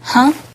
combo break; saiyku [rby] instafade

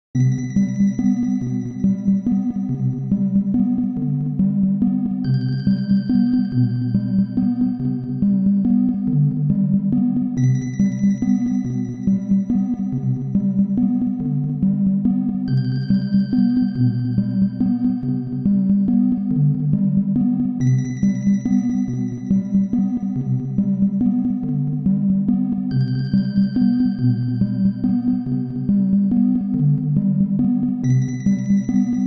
pause loop; Yume-Nikki

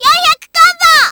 combo burst; Taiko AC0 v4

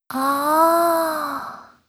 section fail; Katou Megumi

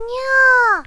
menu back; Epilogue

